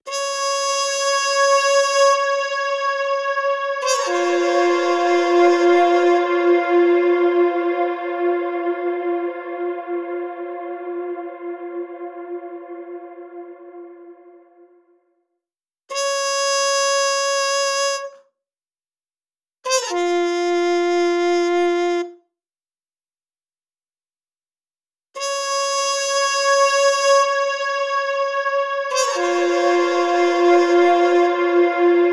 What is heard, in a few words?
Muted Trumpet Galactic Cathedral; Stereo; Blackhole Immersive